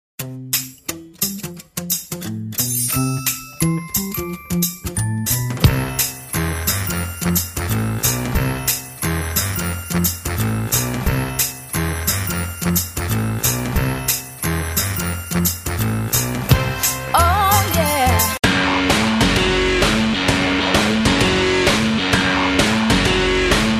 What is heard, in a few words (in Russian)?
Музыка выход вороны; День Байкала; Экологические сценарии